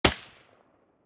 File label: silencer sv98 mono far